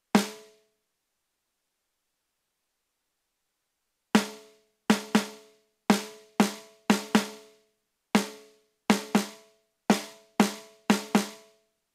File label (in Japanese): スネア 生; リバーブ